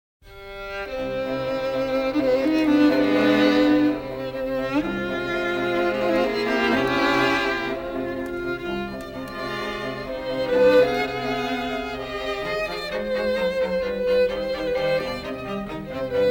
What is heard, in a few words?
strings; beethoven; cloudbounce